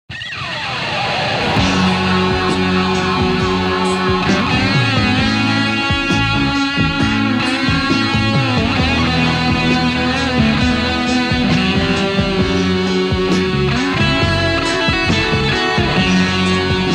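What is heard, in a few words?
Solo, guitar; Lunar Module; SSL5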